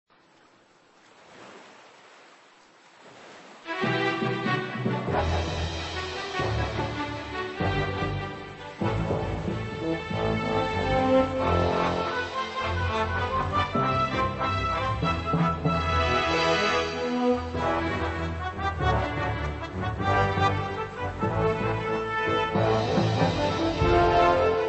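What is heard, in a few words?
Ship Combat, game music; monkey island 3 the curse of monkey island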